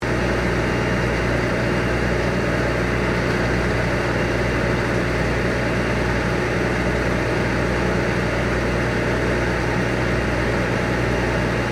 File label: Chairlift wheelhouse loop, Chairlift operating; Skiing and Snowboarding